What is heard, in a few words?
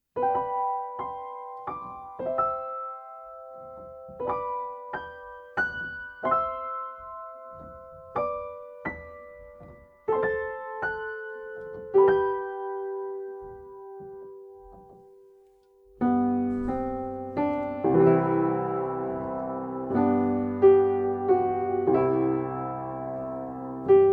instrumental